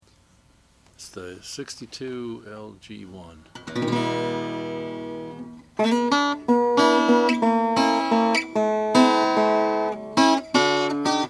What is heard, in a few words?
gibson lg 1 sunburst; 1962